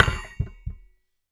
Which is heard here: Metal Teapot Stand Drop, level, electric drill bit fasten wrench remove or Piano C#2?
Metal Teapot Stand Drop